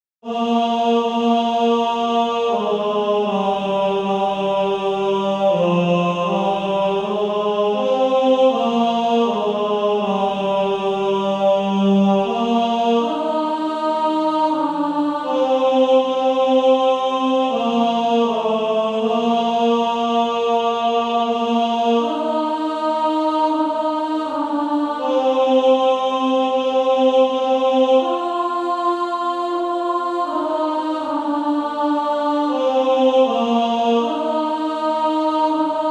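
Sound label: Male Choir, tenor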